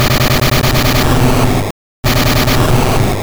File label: Explosion